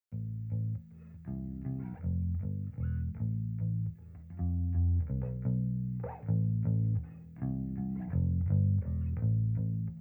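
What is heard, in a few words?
D112 mono Bass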